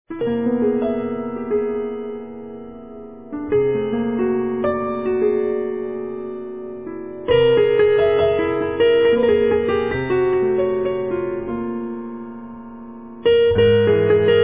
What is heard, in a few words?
8 pianos; ベルギージャズ